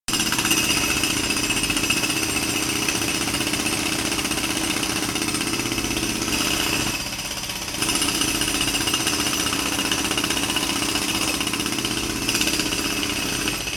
industrial tools jackhammers operating; sound effects one

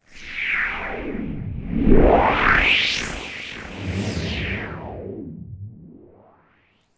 sound design transition filter pass sweep delayed

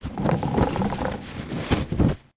toilet roll dispence from holder; public places

